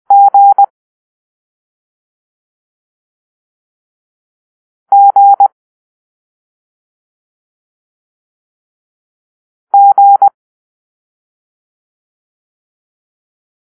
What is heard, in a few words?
Golf (G), morse code